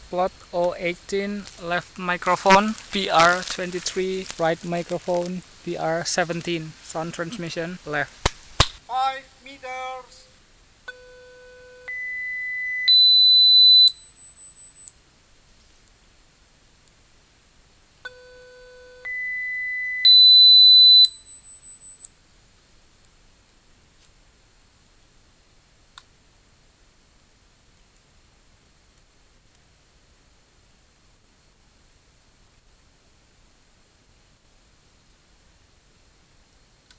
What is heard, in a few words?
birds